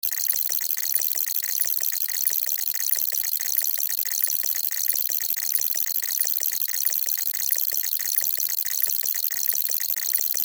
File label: Science Fiction Computer Process Beeps; Computer Sound Effects; Computers (Sound Effects:Science Fiction); SFX Bible 6